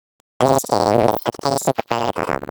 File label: Vox, Circuitbend; 99Sounds; Mangling Audio